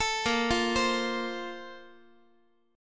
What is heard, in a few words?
Bb Major 7th Suspended 2nd, Guitar; x,x,12,8,9,11; 4A,4E,5A,5D,5G#,6C#; arpeggiated